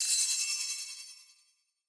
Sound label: shiny effect, battle; CrossCode